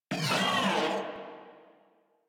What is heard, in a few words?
Moa Vocal Pain, Enemies; Warframe